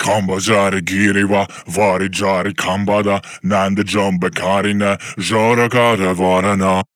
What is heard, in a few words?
138 Shamanic Voice (Dry), Psytrance